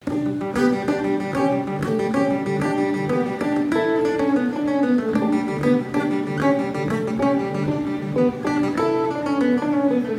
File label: guitar